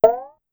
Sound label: Cowbell CR Up, Clavia DDrum-AT, Pitch Env; Pitch Env Various